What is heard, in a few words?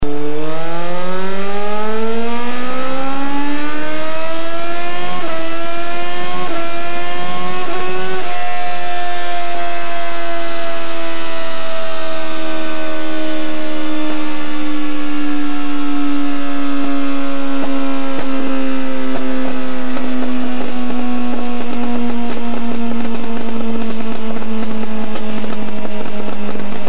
Yamaha R1 black widow pipe